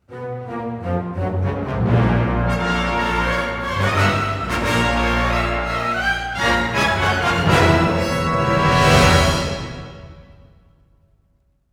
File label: Rebel Loss